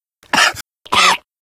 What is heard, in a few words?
cough, survivor voice